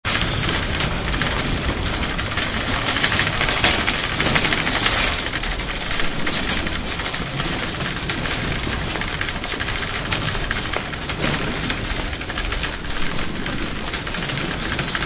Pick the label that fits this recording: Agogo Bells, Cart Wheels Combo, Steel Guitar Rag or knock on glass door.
Cart Wheels Combo